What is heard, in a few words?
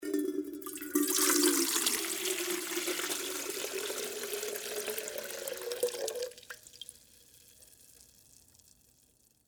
Water Pour Pitcher, Metal; Pouring; Sound Effects:Objects; BlastWave